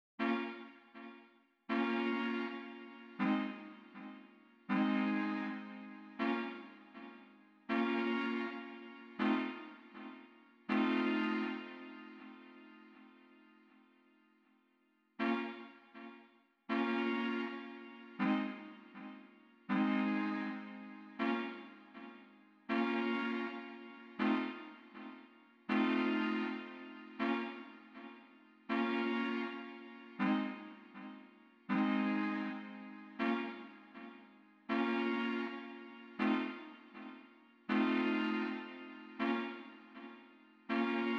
chords

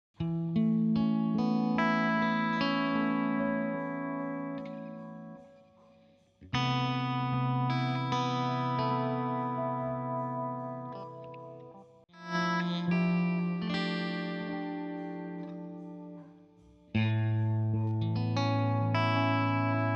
guit stacco

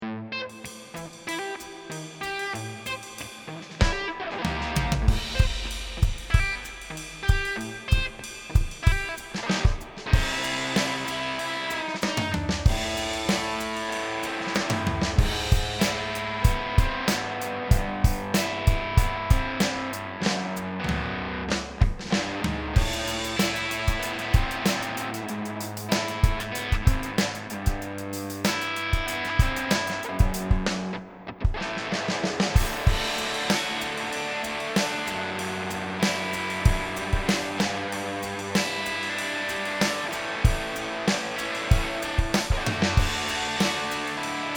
ricordato drum

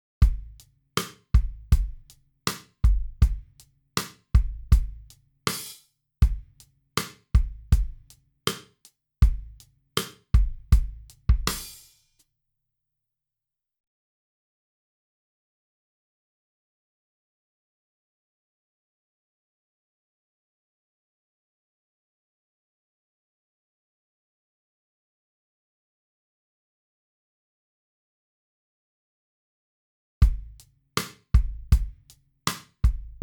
Slow Jam Kit

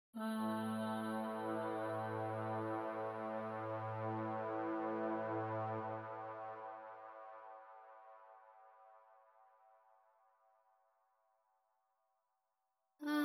Dream Voice

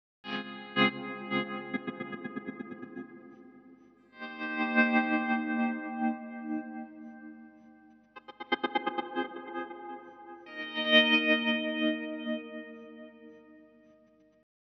guit

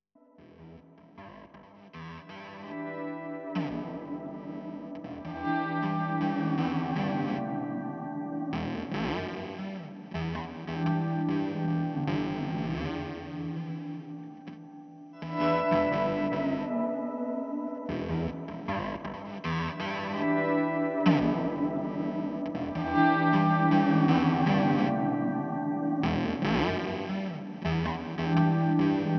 guit loop

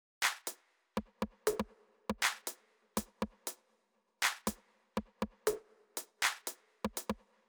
Deep Tech

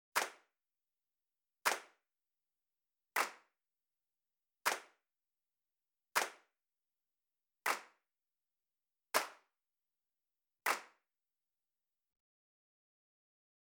Motown Revisited Kit

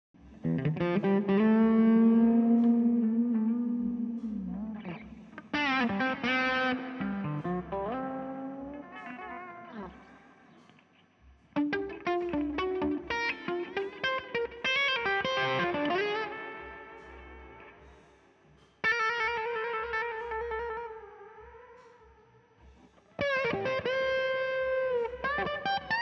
guitar

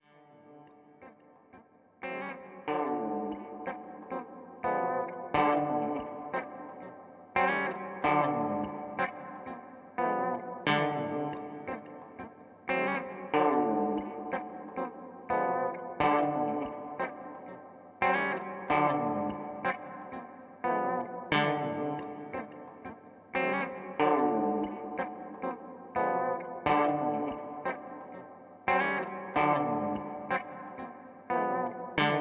guitar

